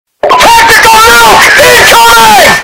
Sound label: nuke